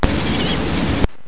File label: plover